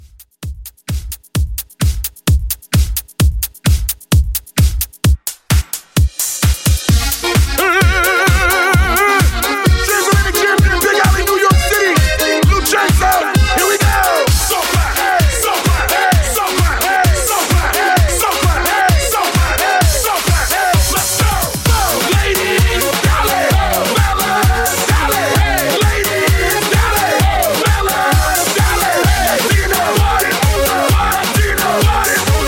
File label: Kuduro Throwback Redrum 130Bpm